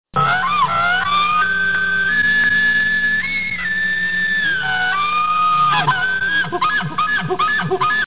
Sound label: elk sound